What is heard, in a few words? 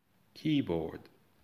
keyboard